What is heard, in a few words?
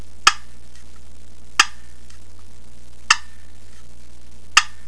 camo walnut clucks, turkey; dixie